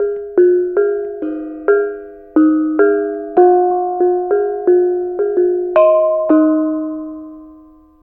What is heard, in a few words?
BONANG; Spectrasonics; Heart of Asia; BONANG PH